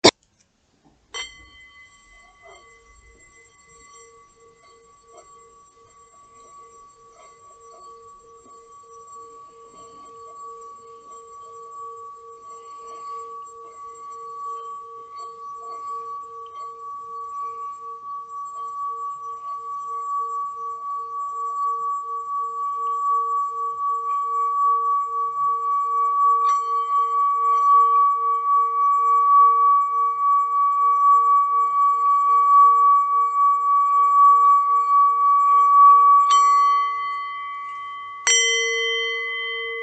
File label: singing bowl